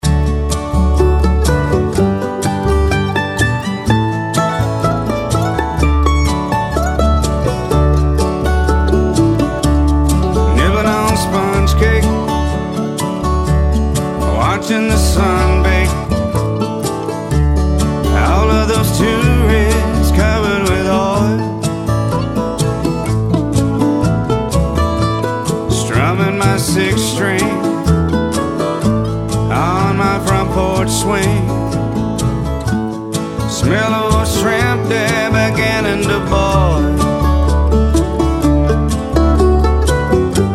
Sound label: SOLO MANDOLIN